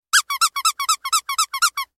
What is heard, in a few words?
squeaky toy